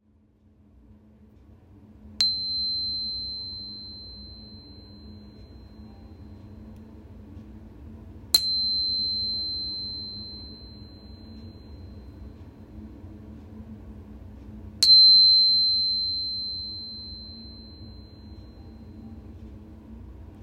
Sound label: crystal tuner